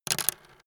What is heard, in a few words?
pencil drop